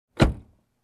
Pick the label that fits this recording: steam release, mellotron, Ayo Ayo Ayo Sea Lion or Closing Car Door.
Closing Car Door